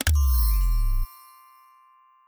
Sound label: puzzle activation